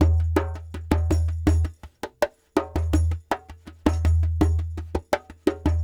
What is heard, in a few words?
Lg Dumbek